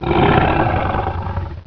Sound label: Shark Growl Short; SharkByteActor; TheByteFAT